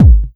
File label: KICK; USB Soundscan vol.20 - Fresh Disco House I